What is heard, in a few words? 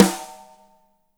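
DRY SN, drums; Spectrasonics; Burning Grooves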